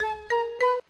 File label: three ascending taps, Stabs